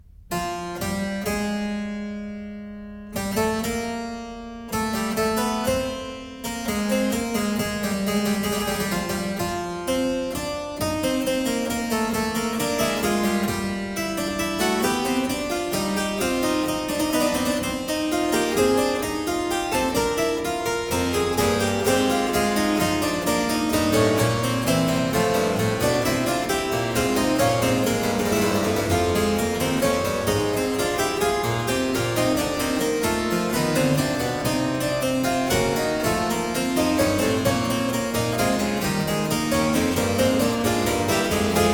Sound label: Fugue a 4, Keyboard Works, The Well-Tempered Clavier; BWV 859 fis II; Klassika; Bach, Johann Sebastian